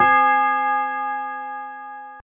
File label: bell C5